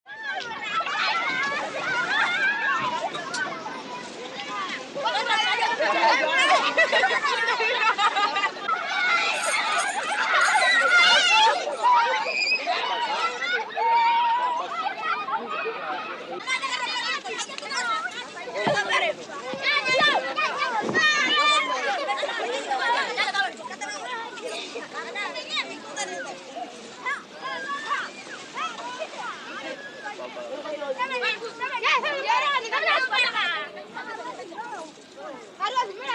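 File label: crowd of children on the street; Звук играющих на улице детей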